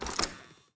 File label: Generic Door Open; SandBox Musics